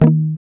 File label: GUI D#, Acoustic, Roland